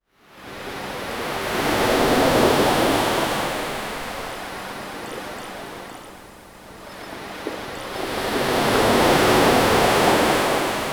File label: ocean waves crashing, waves